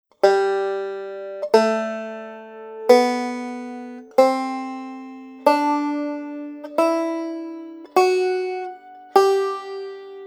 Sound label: G Scale